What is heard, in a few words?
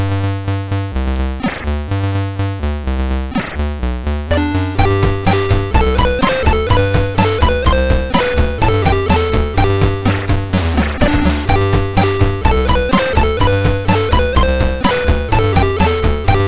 Wilhelmus Dutch Hymn; MOS6581R4